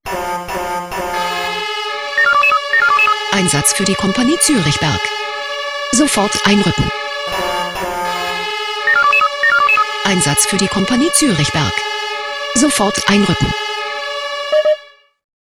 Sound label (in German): SMS Alarmierungstone; KP Zuerichberg